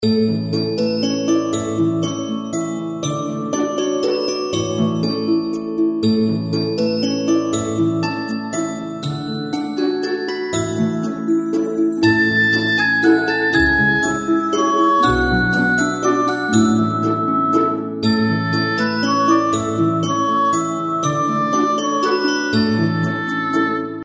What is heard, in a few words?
fishing Game Music; 釣魚